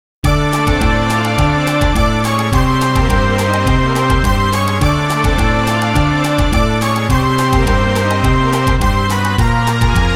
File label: brass minus